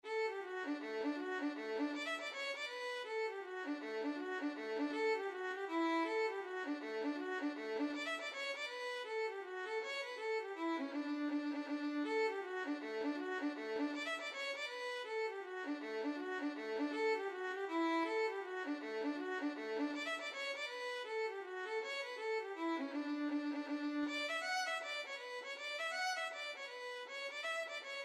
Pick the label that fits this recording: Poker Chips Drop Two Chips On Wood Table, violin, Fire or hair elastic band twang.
violin